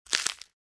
Body part or joint breaking

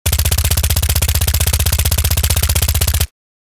Engineer submachine gun full auto fire

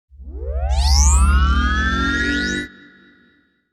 Sci-fi machine power up

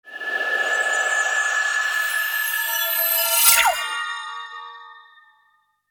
Magical fairy heal spell

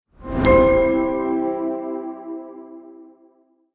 Inspiring intro logo

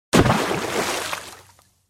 Diving into water with a splash indoor pool

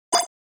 Futuristic error

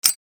Grenade safety pin pull; Military Equipment

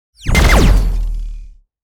Sci fi plasma cannon fire single shot

